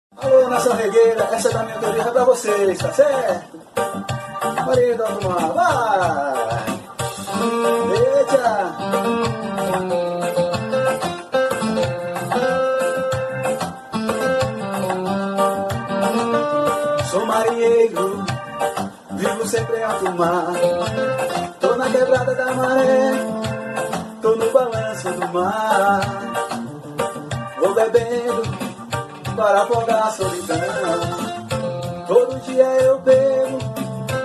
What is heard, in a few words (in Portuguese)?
forró, reggae